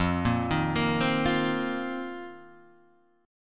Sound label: A sharp Suspended 2nd Suspended 4th